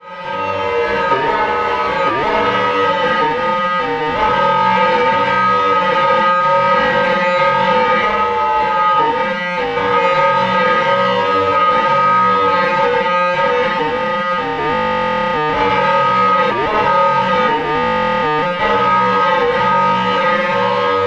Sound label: Korg volca modular Crank